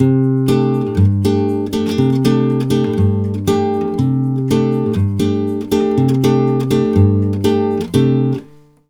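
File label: 120 guitar C, brazil bossa; USB Soundscan; AKAI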